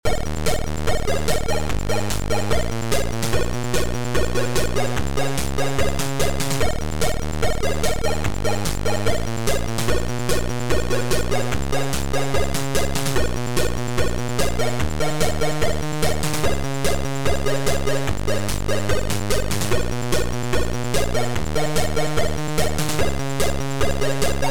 CHORDS

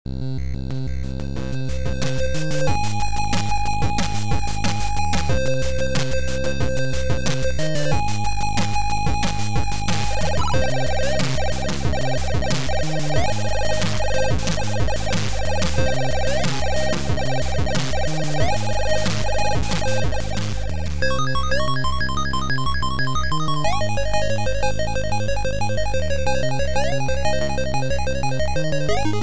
Fast Ambient

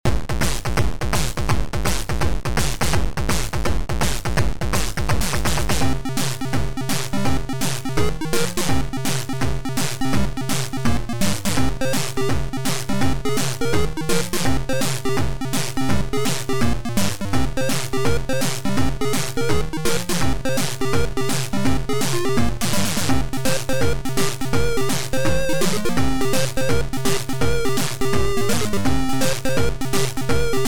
Soft Rock

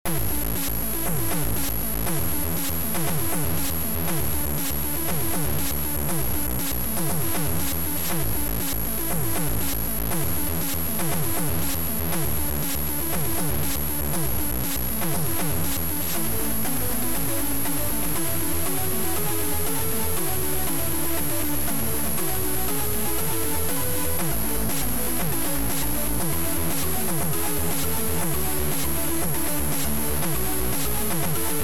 1 Bit is Enough Beeper; Mister BEEP

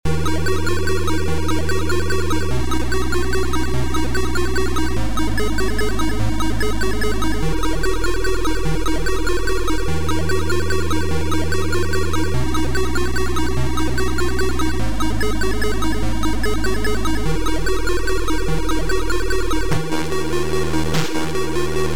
Guitar; Soundliner

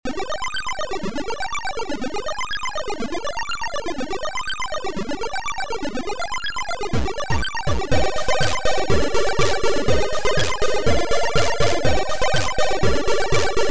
Super Fast Muzak; Tusowka

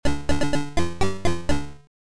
Minuet; Korobeynikov Daniil

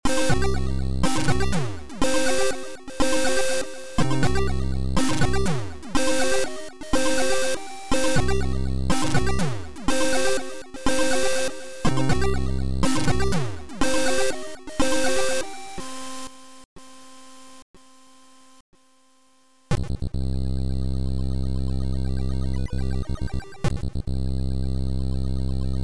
Warning high radiation

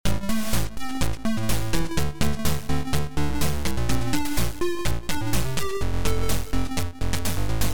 Game music; Tomkin; Santa Goes Psycho 2

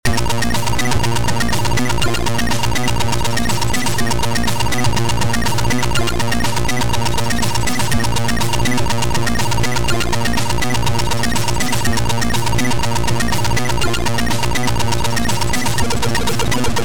Trance

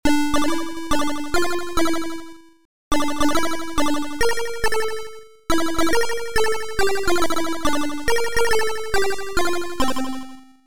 SINUS; Fox; Fluffy s

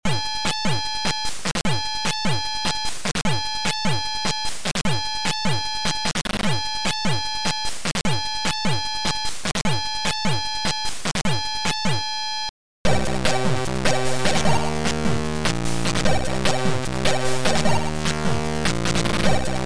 Motor Animation; Factor6

